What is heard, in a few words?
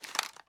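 foley player hybrid scope side off; gmod; viper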